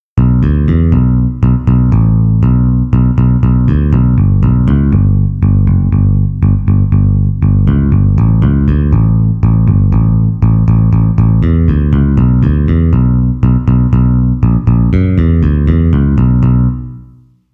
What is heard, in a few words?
finger bass